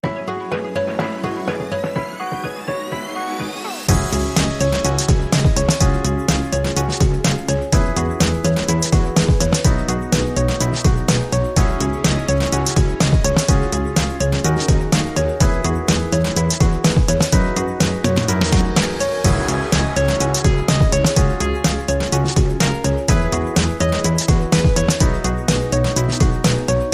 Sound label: Digital Shuffle